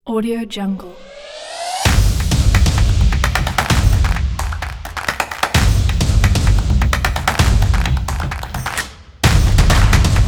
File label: stomps